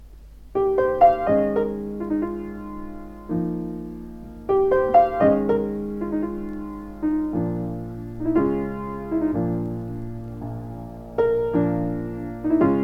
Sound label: piano solo